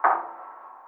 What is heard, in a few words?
tik